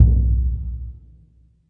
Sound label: big burundi, African Burundi Drums